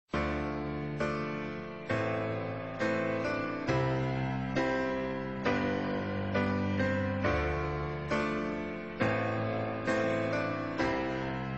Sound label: Piano